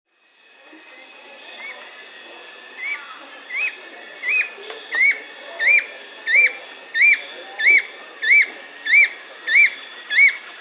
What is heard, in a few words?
Fulvous-chinned Nunlet (Nonnula sclateri), Bolivia, Birds of the Amazon; S. America